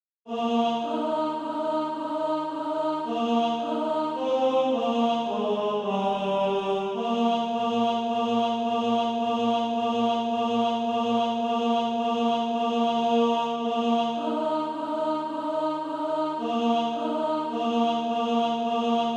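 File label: tenor, Practice Music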